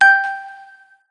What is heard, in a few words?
Music Frontend